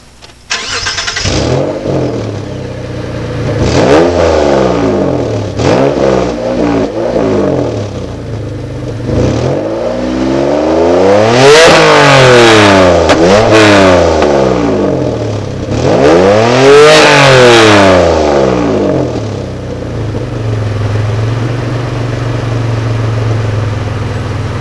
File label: stealth exhaust vr4 with cat; garage; mods